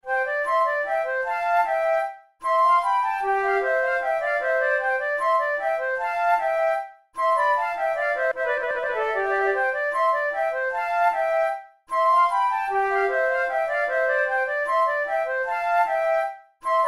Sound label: menuets; Naudot; 6 babioles